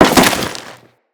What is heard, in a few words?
Crewman Land Dirt, Footsteps; Enemies; Corpus